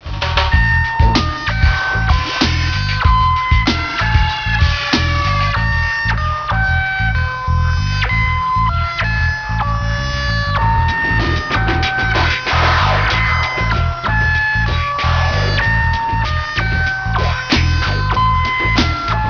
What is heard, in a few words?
wahwah zoomars; on-u